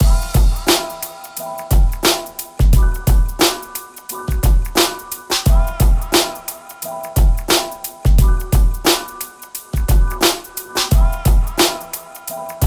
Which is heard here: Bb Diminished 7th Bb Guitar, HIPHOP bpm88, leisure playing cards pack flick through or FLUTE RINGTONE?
HIPHOP bpm88